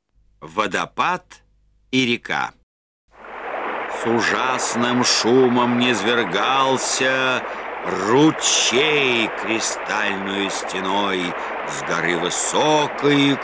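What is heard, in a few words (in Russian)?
Vodopad i reka, waterfall and river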